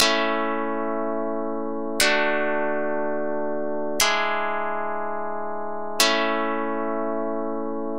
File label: chords